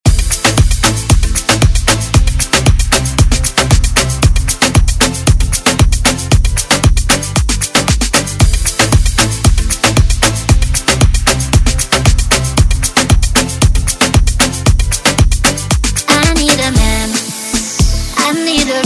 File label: Moombahton; Redrum